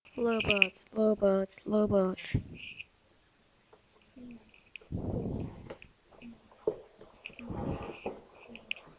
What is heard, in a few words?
robot